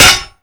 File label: knife metal; Call of Duty Modern Warfare; physics